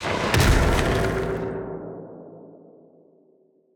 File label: SFX Solo; Yu-Gi-Oh Master Duel